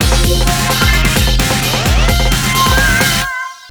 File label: Shatter (Round Start)